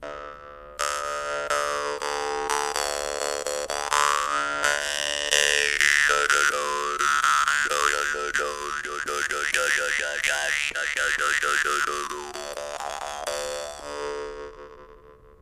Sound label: danmoi doubl